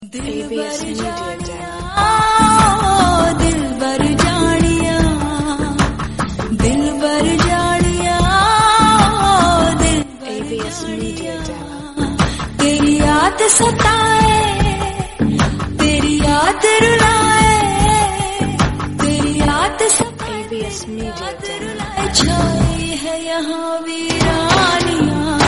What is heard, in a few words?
musical hindi song tone ringtone, bollywood